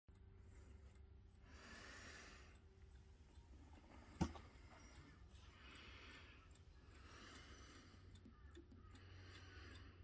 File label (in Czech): stolni westmister biti; hodinarstvi